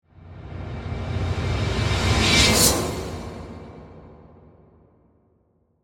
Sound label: Whoosh Metallic, Slice; Crescendo; BlastWave